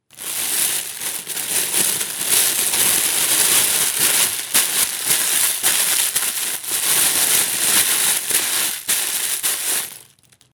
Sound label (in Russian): zvuk paketa